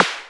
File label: Snaredrum, Roland System-100